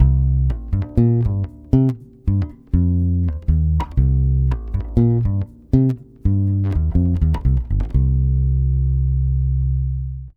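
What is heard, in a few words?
120 BASS C minor, Brazil Bossa; USB Soundscan; AKAI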